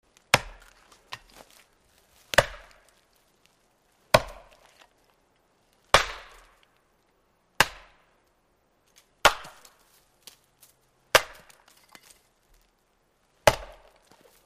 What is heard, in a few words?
Ax Chopping Wood Heav